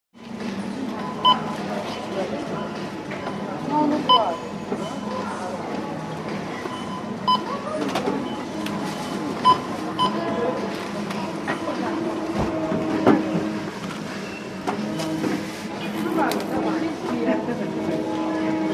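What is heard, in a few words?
atmospheric sound of a queue at the checkout in a store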